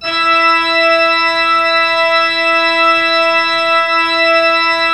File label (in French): Orgue Eglise Full E5, Pipe Organ Full